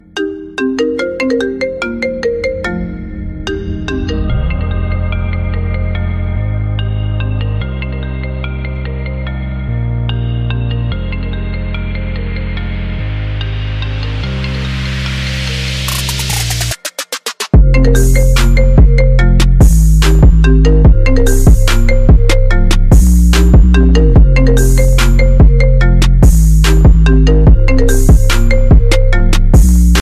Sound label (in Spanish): tonos iphone 8 plus